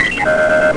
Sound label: computer; solotrekii